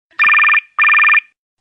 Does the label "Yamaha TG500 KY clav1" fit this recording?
No